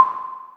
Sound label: end step; cockatrice